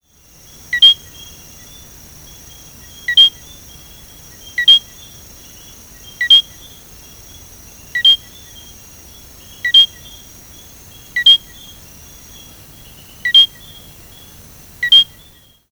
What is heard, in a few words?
Eleutherodactylus eileenae